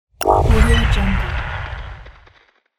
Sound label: sci fi button